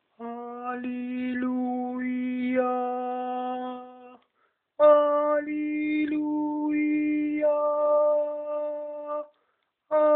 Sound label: Alleluia tenor